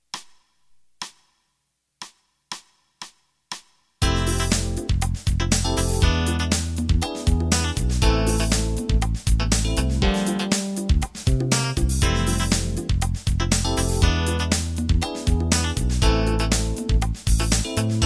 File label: acid jazz